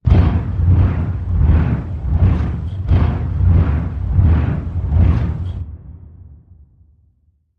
Hellish Turbine; Shadow Woods

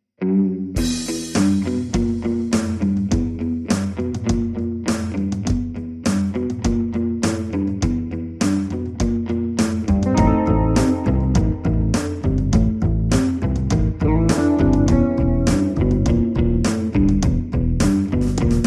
Blues

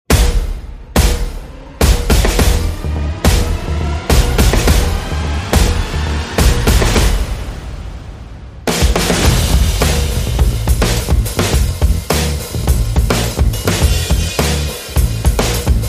Percussion Bass and Effects

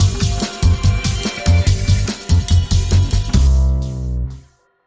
The Bassman Sting

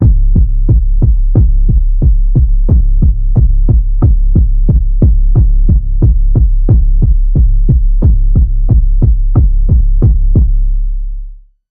Underwater Pulse Wave C sharp

